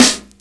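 good snare b key, acoustic snare tuned to the B note; snare drum samples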